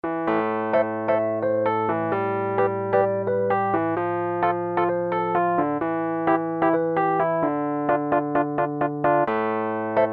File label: V-Piano